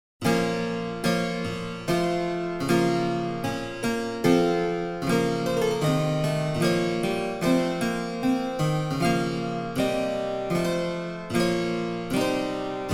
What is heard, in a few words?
German Harpsichord, Front 8; German Harpsichord 1738; Front 8 Demo; edition germanisches nationalmuseum